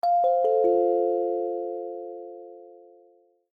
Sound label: Chime Announce